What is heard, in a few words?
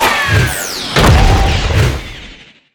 hero instakill